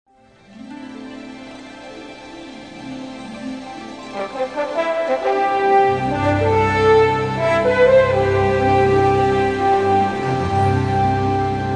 Orchestra Panpipes, When Morning Gilds the Skies (Medley)